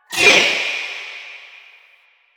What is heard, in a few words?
Choir Erupt Proj By; Warframe